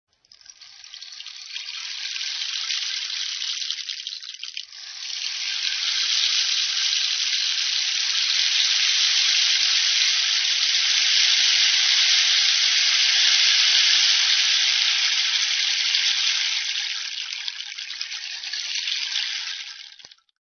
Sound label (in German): Regenmacher